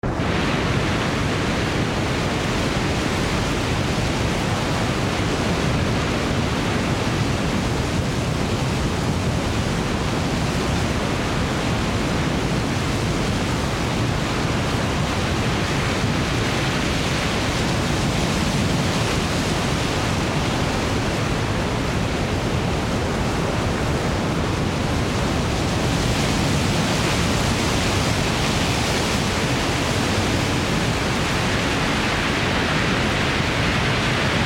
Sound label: japan landslide